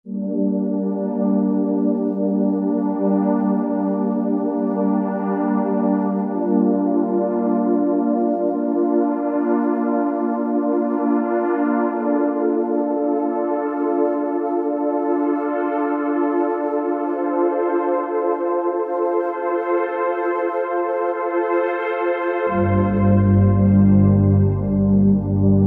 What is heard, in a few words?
Roland V-Synth; Ringbearer; Factory Patches